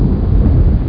rumble

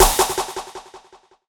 DRUMSHOT D+B 160, Drum+Bass, Singleshots; eJay

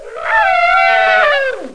wyvern; Total Chaos Nukem 3d Pack; duke

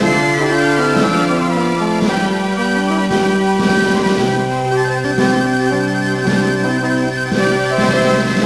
Revolutionary Music; Revolutionary War

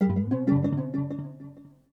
ethno 125

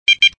BEEP DBL; jungle jiggy

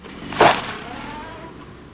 car, transpo cars

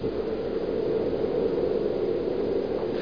wwind

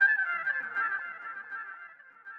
virtuoso; LiveAct Pool

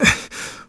roll; Shadow Man

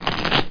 Shuffle

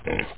pig; PC Gamer IT CD 31; DINK

